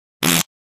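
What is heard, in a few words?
Fart Foley Short; farts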